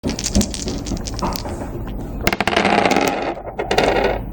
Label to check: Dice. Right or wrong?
Right